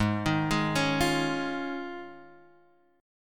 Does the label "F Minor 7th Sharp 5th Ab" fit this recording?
Yes